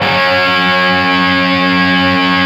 G 4 SOLDAN, Guitars, SOLDANO ROCK; Spectrasonics; Hans Zimmer Guitars VOL-1

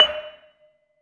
LAMELS D4, Asian Traditions; USB Soundscan vol.60; AKAI; LAMELOFO

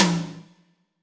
tom, drums; Yamaha SY85

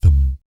BASS THUM; Spectrasonics; Vocal Planet; Jazz FX

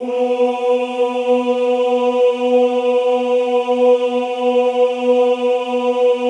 OOH B2, Classical Choir; Best Service; Extended Classical Choir; OOH MIX

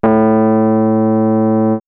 Rhodes; X-Static Goldmine; backtothe70's

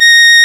REED A#; Big Fish Audio; Synclav FM

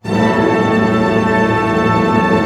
TREM A FF, orch trem; Sound On Sound - Peter Siedleczek's Orchestra

